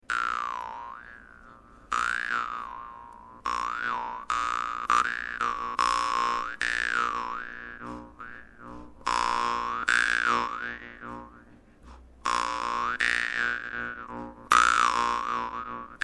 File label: vargan, khakass; volgutov big